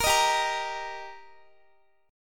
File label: G Minor Sharp 5th G Guitar, chord; Gm#5 strummed